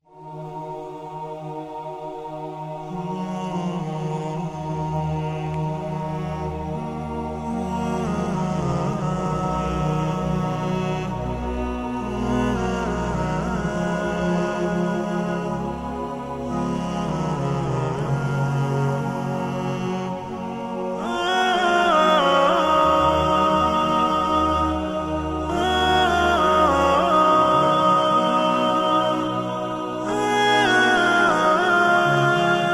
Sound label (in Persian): Vocal Shoor